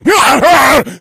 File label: rage at victim, infected; Left 4 Dead 2; Source Engine